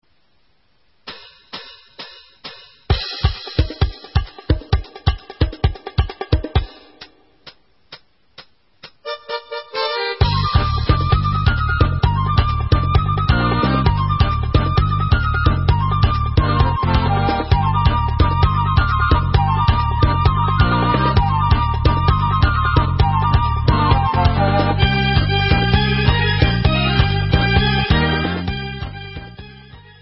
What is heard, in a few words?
Instrumental